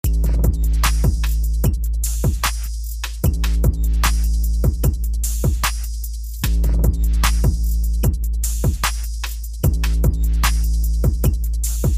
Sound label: Musical Beats; smirksmilegrin games